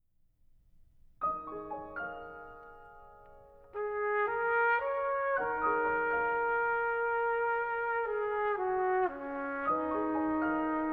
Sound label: Sonata for Trumpet and Piano Lento Allegro Molto